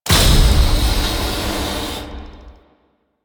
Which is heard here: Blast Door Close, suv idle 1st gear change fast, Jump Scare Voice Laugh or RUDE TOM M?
Blast Door Close